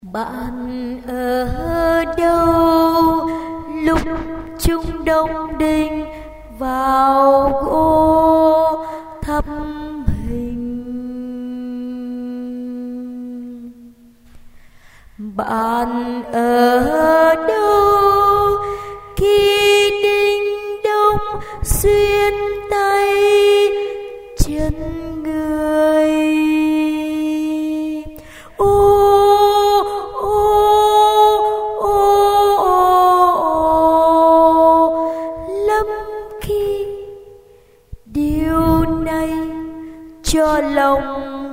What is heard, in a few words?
alto